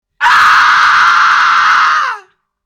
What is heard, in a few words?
scary scream